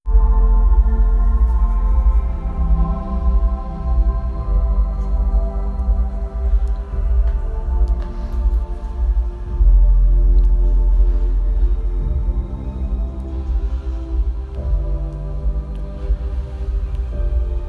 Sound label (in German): musik